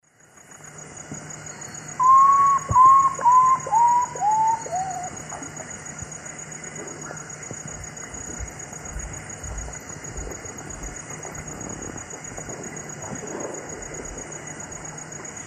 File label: Nyctibius griseus, song; S. America; Eastern Lowlands